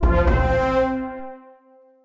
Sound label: Quest Continue; Test Realm